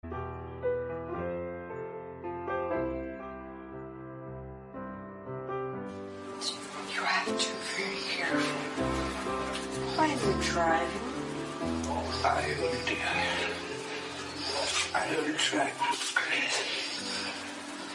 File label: bangla ringtone; ringtones